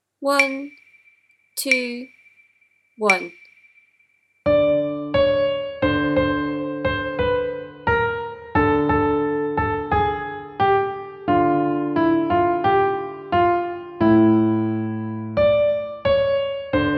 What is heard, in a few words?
habanera from carmen